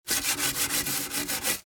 Cleaning scrub brush scrubbing back and forth motion